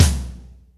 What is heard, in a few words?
Room, Acoustic Tom; Yamaha DTXpress